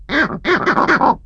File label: leopard, Baboon